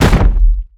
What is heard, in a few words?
wood deep impact; physics